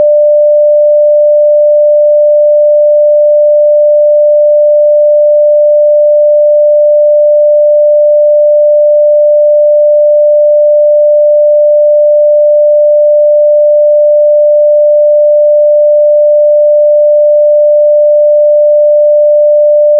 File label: E string D